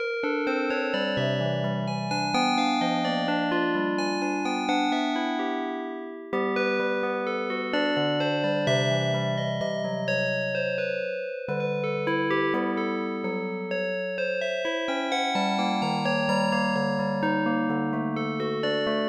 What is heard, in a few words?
cmaj